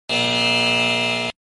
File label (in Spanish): Efecto de sonido Error